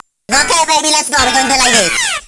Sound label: Battle Mode Stage Start